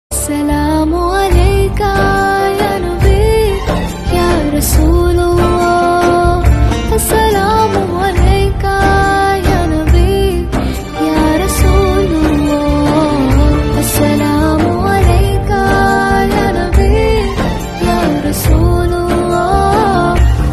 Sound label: Arabic ringtone